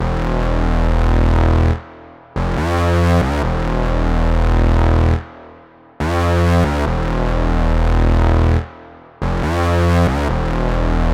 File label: 140 BPM E Min Prophet 5 Bass; Synth; Loops; Loop Stems; Cymatics; Fantasy Synth Sample Pack; Heat